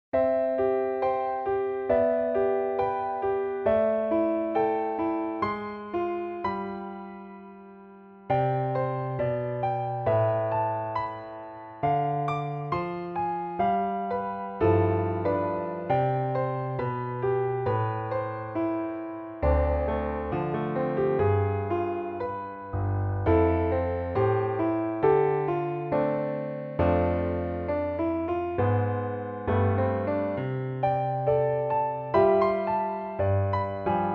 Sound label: Accompaniment